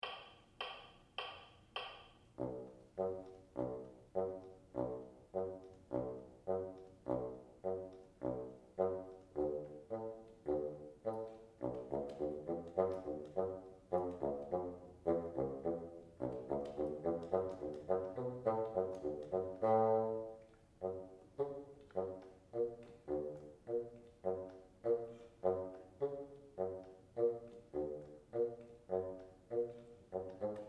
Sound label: bottom, bassoon